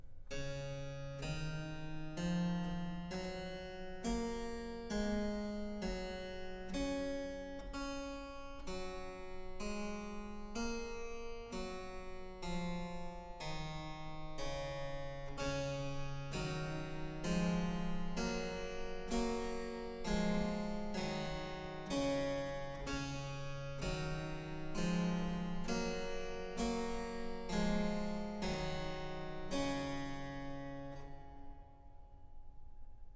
harpsichord solo, Canons on the Goldberg ground; XIV Canons on the Goldberg ground, BWV 1087; II. All’ roverscio; Bach; Musikalisches Opfer BWV 1079

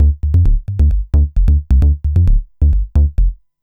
bass, dance; Basses

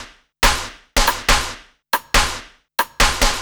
Ensnare Loop, Dance, Beats

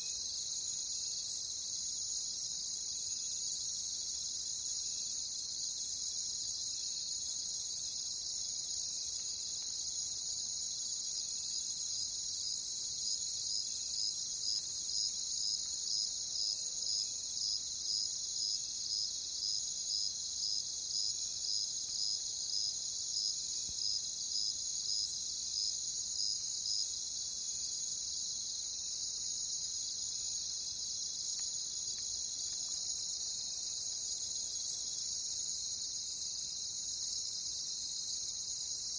crickets; Sleep Sounds of Nature

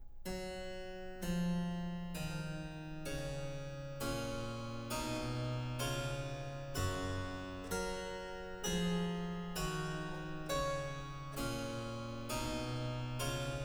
harpsichord solo; XIV Canons on the Goldberg ground, BWV 1087; III. Beede vorigen Canones zug; Bach; Musikalisches Opfer BWV 1079

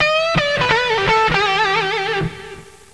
GTR RIFF; MUSIC

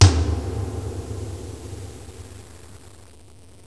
TOM ECHO, PERCUSSN; MUSIC

